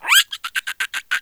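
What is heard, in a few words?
cartoon monkey laugh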